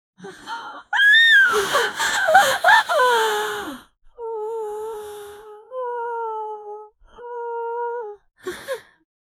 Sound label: female like creature voca